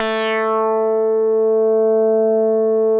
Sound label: MINIMOOG